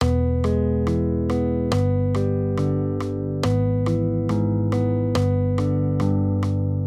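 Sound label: Guitar, Accompaniment, Major Triads, E-G, 140 BPM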